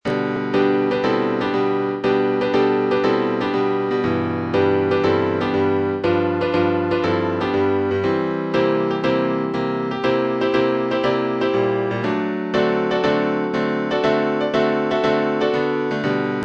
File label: Psalm Make a Joyful Noise, instrumentals